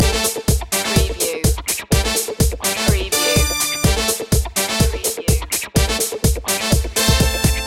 disco funk loop

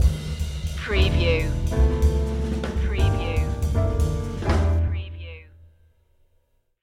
swing stinger

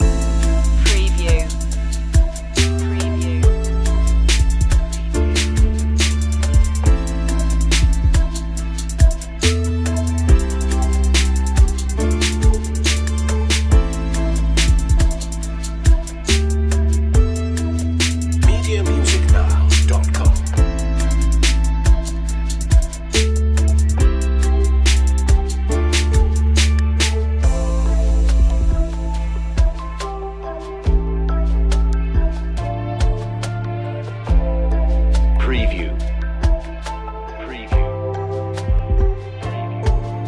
deep trap

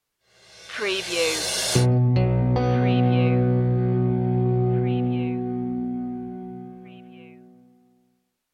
explorations stinger

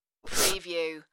Man Gasp

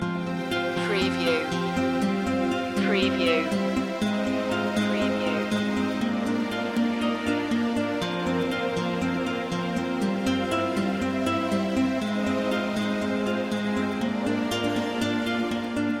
cold summer nights loop

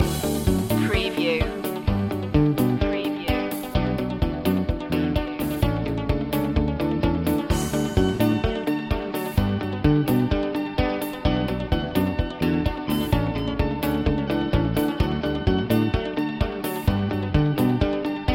corporate ventures loop

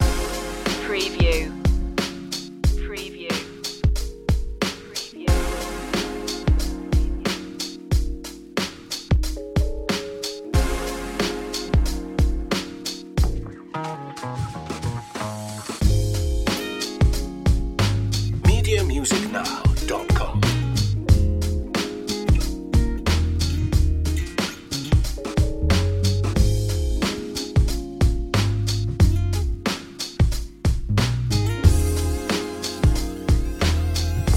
groove on

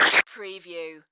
Multimedia Roll Over; sound-effects-bible

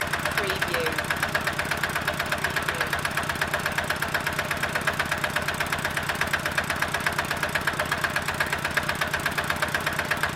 fast diesel pump motor loop

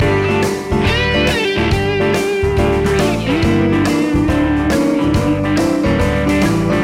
feelgood blues loop

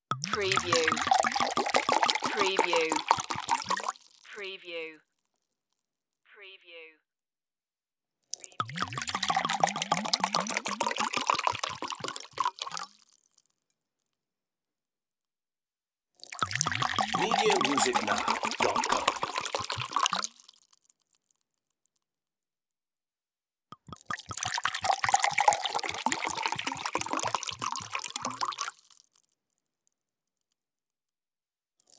wine bottle pouring

